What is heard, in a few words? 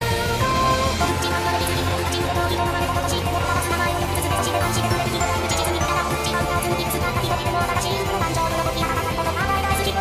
Intense Voice; Miku